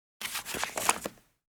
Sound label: open